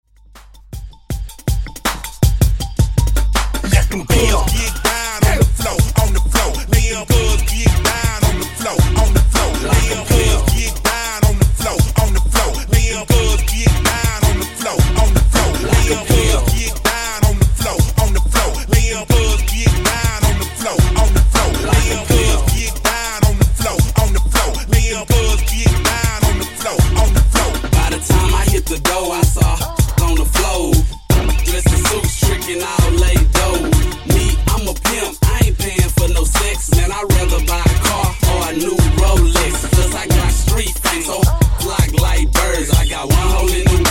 Funk Wordplay 80Bpm

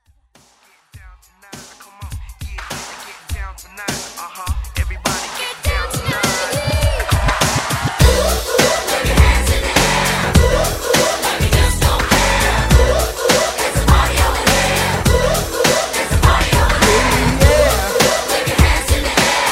90s Pop Redrum

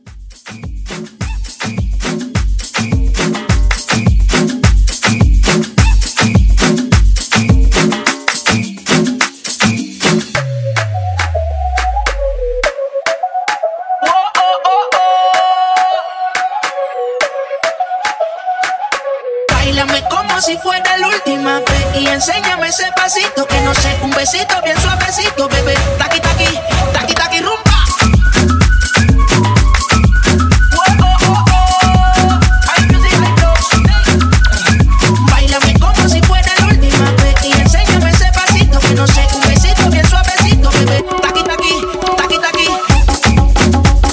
Transition, 105 126

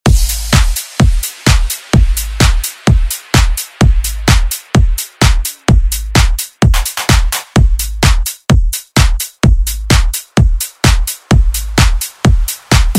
tech house; Deejay Hit

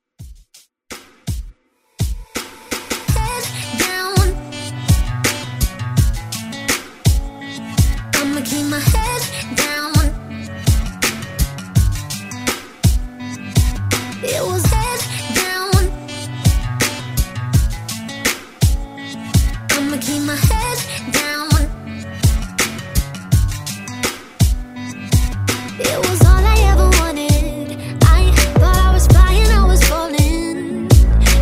Top40 Redrum

